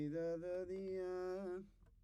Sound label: Trumpet